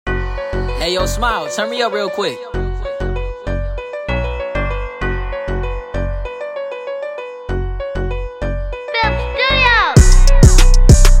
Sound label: boom